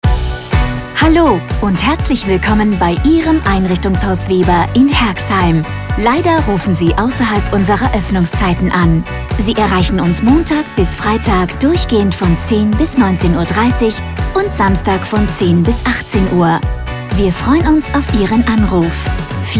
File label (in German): AB Musik; Einrichtungshaus Weber